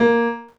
VOC PIANO; gamerip; PS3

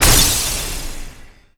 Ball Time Finish

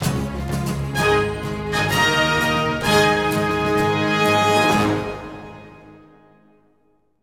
Combat Win; Combat Win Reg2 01

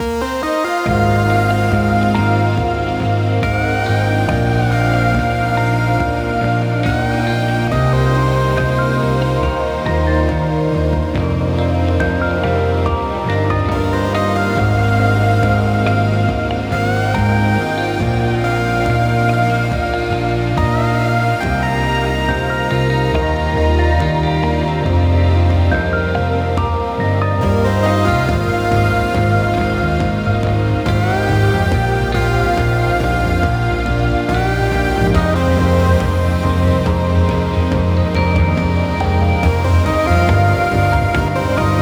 Song Timestream

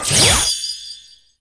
Option Xmas Ball Build

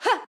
Swipe; Jackie

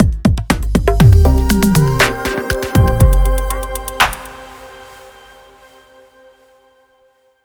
Win; Car Simulator 2; Android, iOS

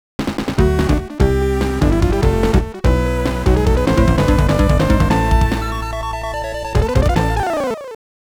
Stage Clear; Gleylancer; The 16bit Audiophile Project; Genesis

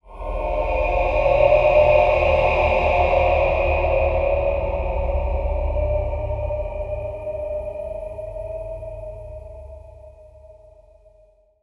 Ambience; Syndrome; Bluezone-Dmovie-Ambience

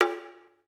Taiko; SE Net#19 (SE Net07 Taiko)

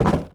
Stand Up

